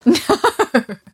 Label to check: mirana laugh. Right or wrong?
Right